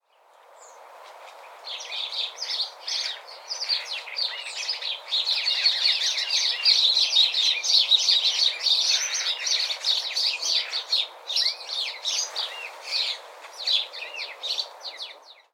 Sparrow Chirp

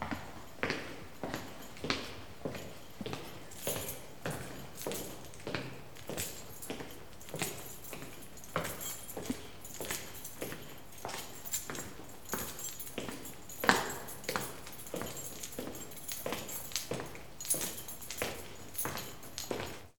Keykeeper Walk Indoor

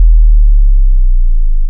Sine Sub Bass E1 G0

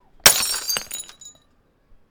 Glass Breaking

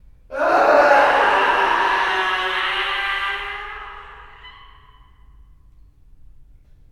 Scream Aeh

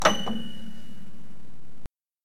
Piano

